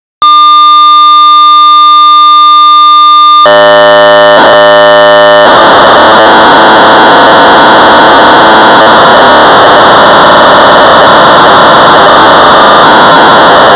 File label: Sorcerer 1200 baud; Super Asteroids; Apollo; System-Software